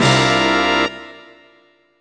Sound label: Hit; Banjo Kazooie